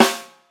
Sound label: snare drum sample g key